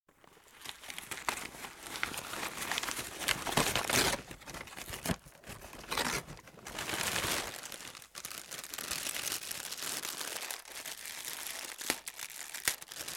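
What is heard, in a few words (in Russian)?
открыть мешок чипсов, картофельные чипсы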